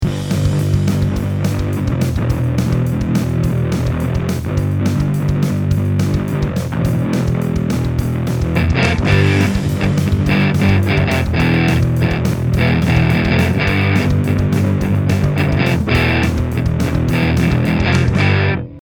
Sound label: Broken Guitar